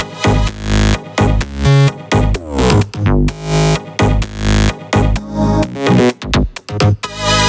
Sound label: Freak Melody 1 Root G#, 128BPM; Vengeance Samples; Freak Kit